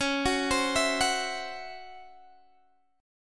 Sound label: Db Minor Major 7th Double Flat 5th Guitar DADGAD